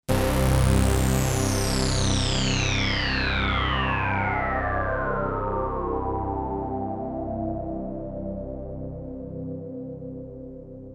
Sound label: sem reso sweep obx